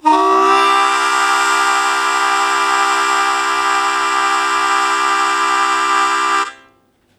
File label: Blues Chord Attack C1, Harmonica; 90 s Sample CDs; Zero G